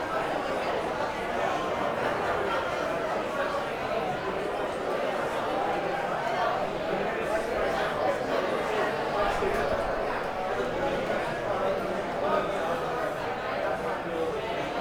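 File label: Diner Ambience Crowd Walla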